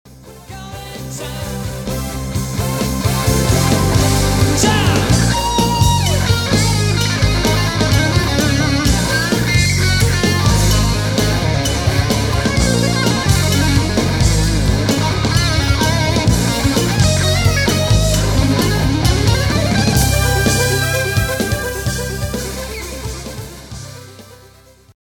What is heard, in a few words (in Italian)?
jump